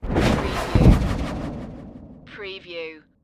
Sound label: Magical Projectile Whoosh Impact